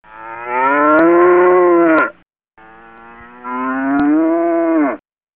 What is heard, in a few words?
Cow